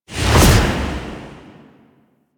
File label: Sonic Dissipation Cast; Warframe; Focus; Vazarin